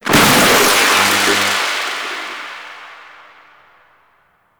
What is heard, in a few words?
Shipyards Lightning; Exterior; Warframe; Grineer Shipyards